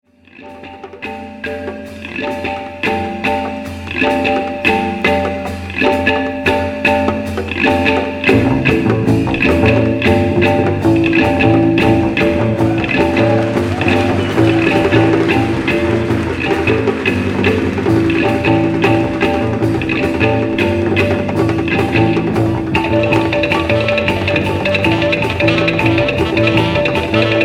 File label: oriental wind